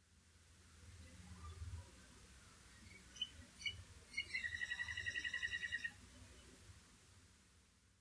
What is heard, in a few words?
little grebe, nocmig